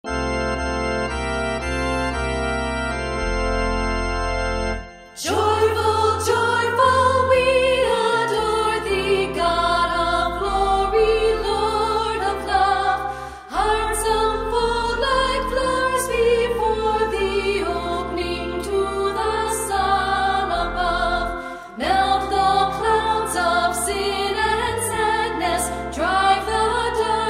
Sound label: choir; easter music; joyfuljoyful